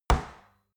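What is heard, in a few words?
step heavy stomping, footsteps; schritte; menschen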